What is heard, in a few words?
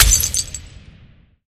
symbol Explode; shangrila